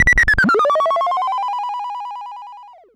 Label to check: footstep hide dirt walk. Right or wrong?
Wrong